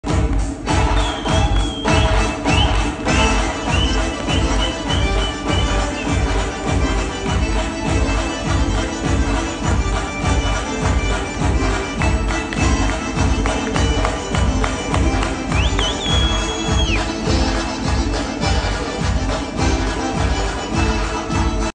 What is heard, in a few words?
lezginka, circassian; kavkaz; adigeya; adiga; nalcik; maykop